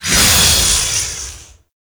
Zap Deactivate; Trapper

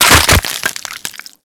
Infested Diseased Ancient Chest Open

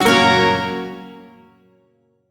Shawzin Yo Chord F; Warframe; Duviri

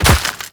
Step Dirt, Footsteps; Warframe; The New War; Characters; Kahl

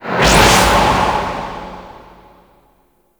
Infested Ancient Healer Blast

